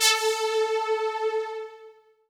lead syn, Techno Trance

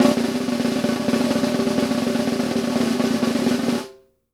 ROLL, SNARES; Sampleheads - New York City Drumworks VOL-1; SW SNARES

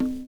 PERCURT; Univers Sons - Kitch 70s

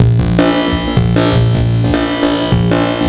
Bouncing Break, Drum Loops

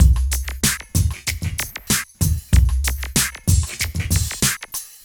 Smooth, R&B Drums

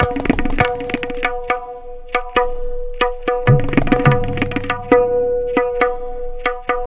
tabla, 140 bpm; Zero-G; Pure Tabla

